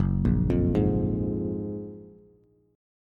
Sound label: Gb Major 9th, down, Bass; GbM9; arpeggiated; JGuitar